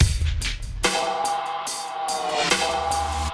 Vespa, Drum Loops, Compressed; Zero-G Smoke

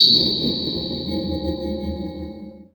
HITS, Special FX Twisted; USB Soundscan; AKAI

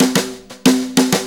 Brushes Fill; Sonic Foundry (Sony Creative Software); Loops & Samples Drum Tools

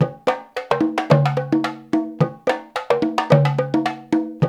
108 NONG, Nongo; Spectrasonics; Supreme Beats; African Contemporary

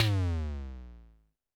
TD-20 Tom B, Roland TD-20 Toms

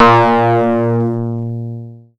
PLUCKED, Synclav FM, A#; Big Fish Audio

